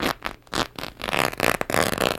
Cloth Tear Shrt Then

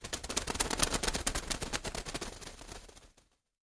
Birds: Wing Flaps.

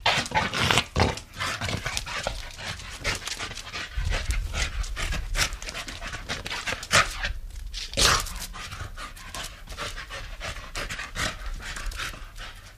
Pit Bull Gulp Pant

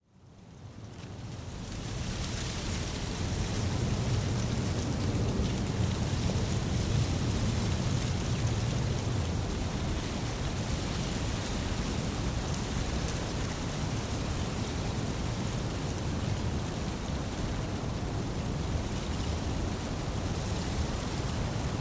mountaintop wind; trees & gusts

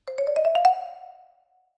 Xylophone Shrt Ascen, mallets xylophone

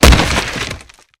Body Falls On Debris

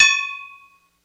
Anvil Being Hit Hamm, anvils

